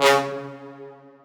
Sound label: synth one shot, Dbmin; TROPKILLAZ